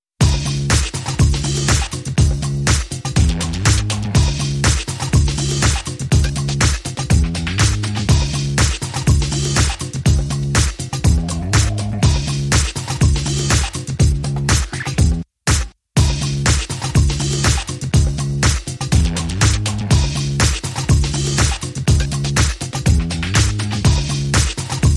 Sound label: Bed Mix